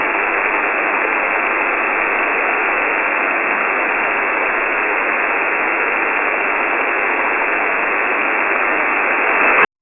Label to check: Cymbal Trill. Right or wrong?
Wrong